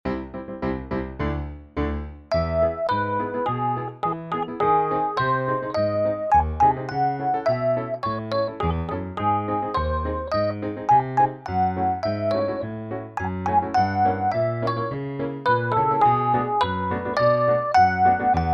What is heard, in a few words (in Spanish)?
REFE PIANO; convocatoria a audiciones el mago de oz